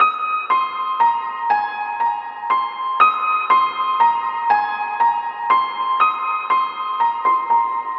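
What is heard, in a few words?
piano loop heavenly melody, 120, G major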